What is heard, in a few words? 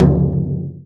BASS, INDIAN PERC